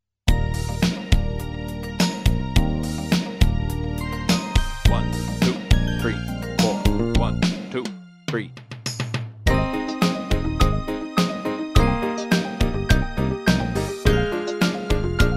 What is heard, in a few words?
Scale Down